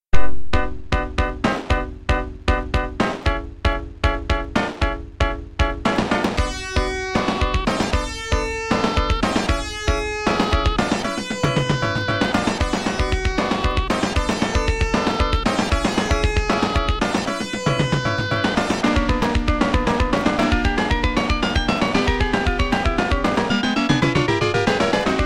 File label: cart music level 7